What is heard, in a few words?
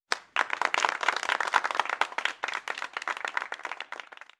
golf clap